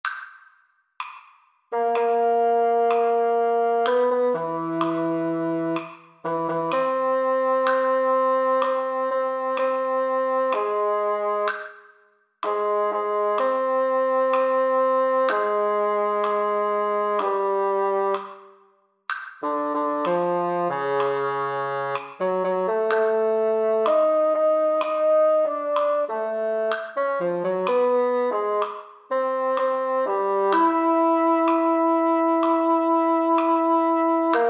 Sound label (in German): Ein Ueberlebender aus Warschau mit Metronom